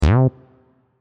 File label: damage retro gravity sound